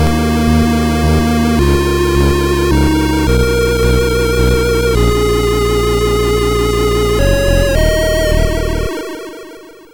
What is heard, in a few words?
Jingle (Slow); No Second Prize; Atari ST